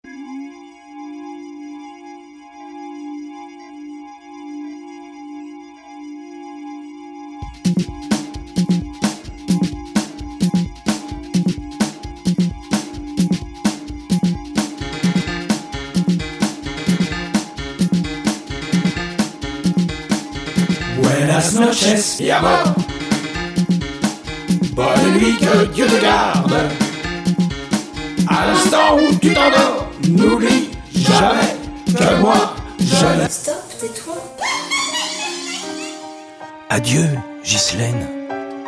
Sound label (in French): le tango de trop